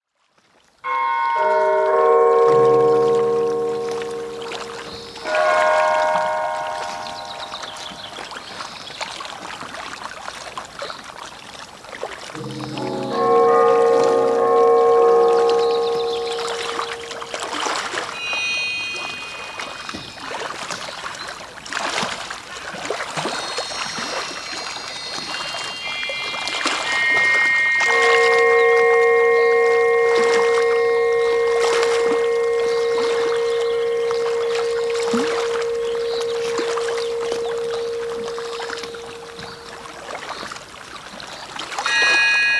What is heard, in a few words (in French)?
Boucle Ambiance